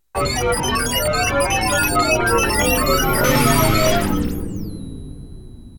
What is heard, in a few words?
notify drone; openmoko